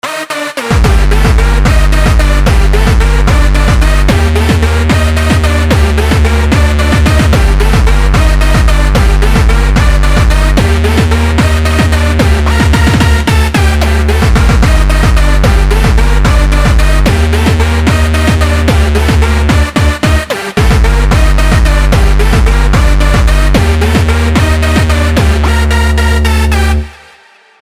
hardstyle

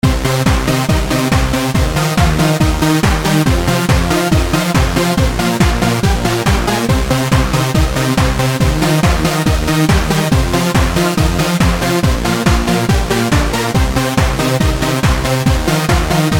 a random beat

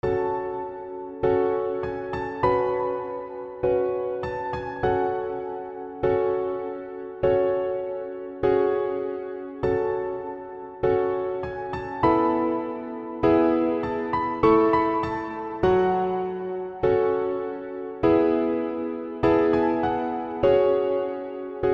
piano

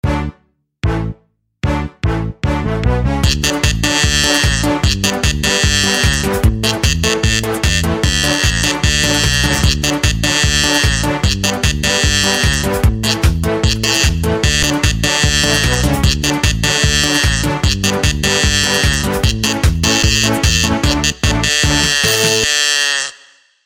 cow song

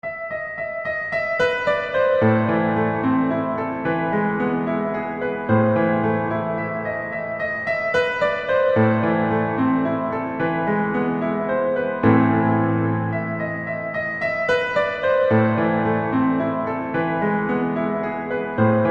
fur elise piano

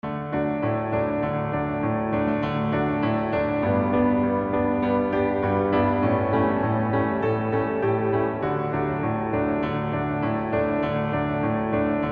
jingle bells practice

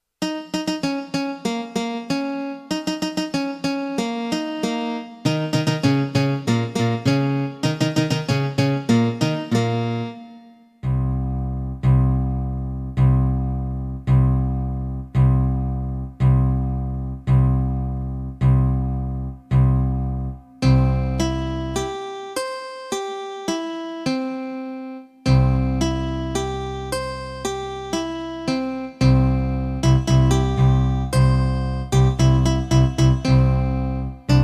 Bass Tester

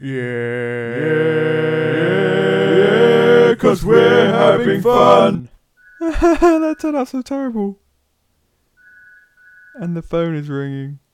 quartet

